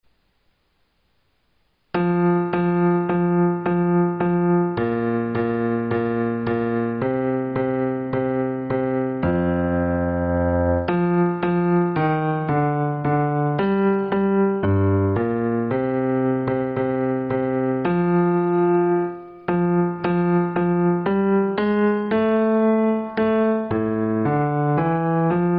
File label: bass; o little town of bethlehem